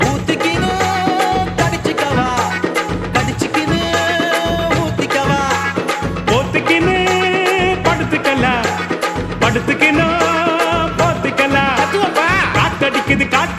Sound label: Tamil Gana Song